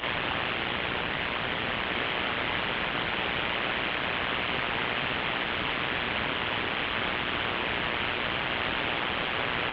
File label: powerline buzz; rfi